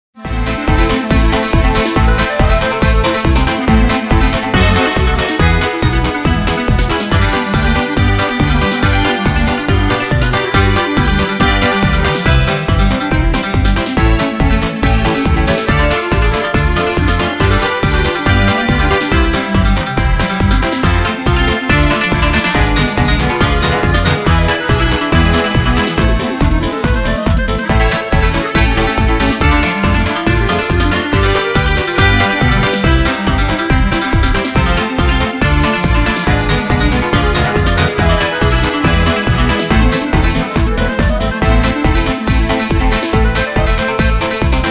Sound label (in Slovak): cimbal ciganska, K800; Gizka